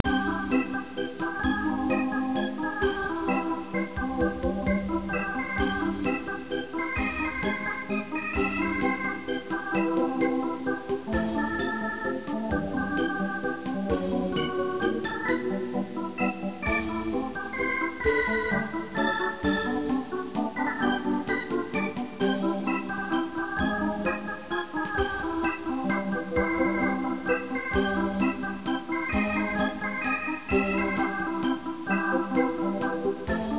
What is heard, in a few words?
Piano Waltz; Spy Fox in Dry Cereal